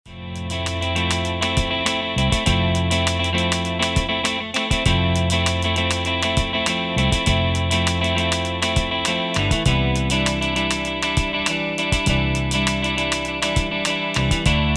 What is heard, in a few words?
Guitar Strat Strumming; ManyGuitar